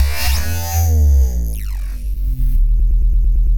Glitchy bass growl

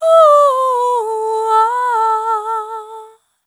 Devotion Dry, vocal samples; Sonic Foundry (Sony Creative Software) - Pocket Diva World Class Vocal Samples; Op-Erratic Loops

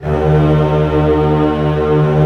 STR A0 FF, Orchestra; Sound On Sound - Peter Siedleczek's Orchestra

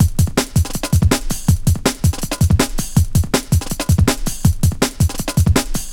Loops, breaks; M8

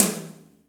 ROCK TOM; Big Fish Audio; Synth City

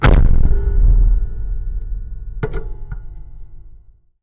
Raw, Wire & Tin, contact mic

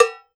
COWBEL; Drums&Percussion; E-MU Producer Series Vol. 7 – Old World Instruments

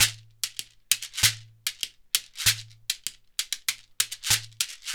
SHEKERE, Synclavier Percussion; ILIO

